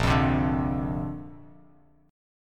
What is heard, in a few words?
A sharp Major Add 9th, Bass Standard; strummed